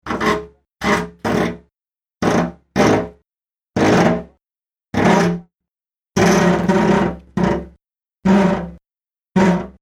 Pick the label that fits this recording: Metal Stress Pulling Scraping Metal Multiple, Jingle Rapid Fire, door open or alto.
Metal Stress Pulling Scraping Metal Multiple